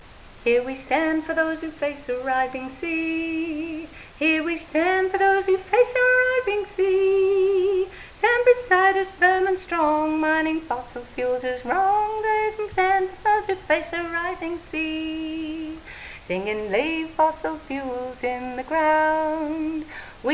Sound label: high harmony